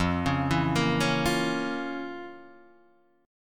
F Major 7th Suspended 4th Sharp 5th Guitar Standard, chord; arpeggiated; 1,4,2,3,2,1; JGuitar